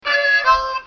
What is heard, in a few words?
blues harmonica lofi; Harmonica Riffs; WORLD